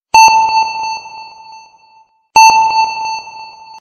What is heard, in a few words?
submarine sonar